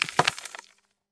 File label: demolish